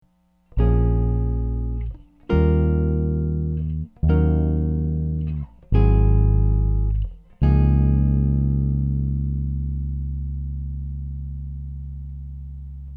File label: cadence